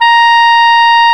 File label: Clarinets; Roland L-CDX-03 Disk 1; Clar Cls